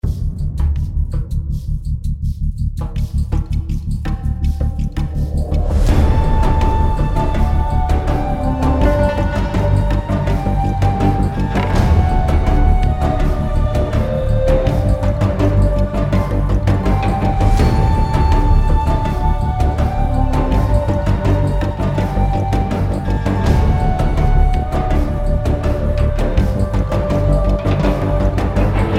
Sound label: music desert battle; savage